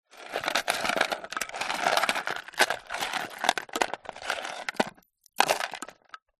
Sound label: household jewelry and small makeup items rummage in wood container